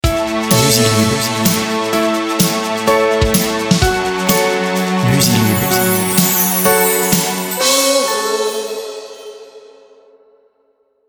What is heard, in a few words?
christmas pop, jingles